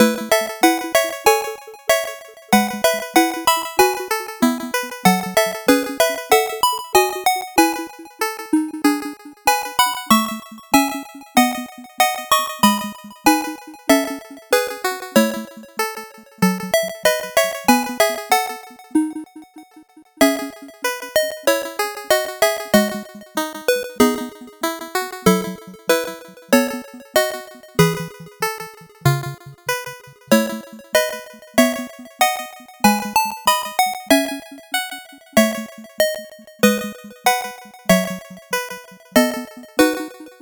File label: 8bit bgm normal